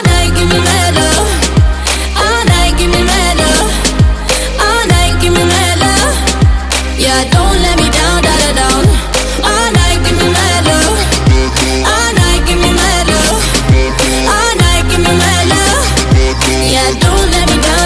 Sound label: music english ringtone